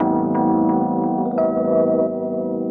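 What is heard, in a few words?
KEYS loop L, Drum & Bass 175 BPM; Ueberschall; Drum & Bass Flash Trackz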